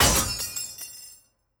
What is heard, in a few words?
Pistol Helmet Expl; Pistol Hero Helmet